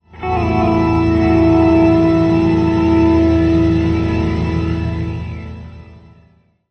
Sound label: Link Sting